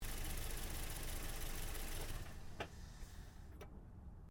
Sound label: Car Toyota Yaris engine shutdown; Toyota hire car; Cars (Sound Effects:Vehicles)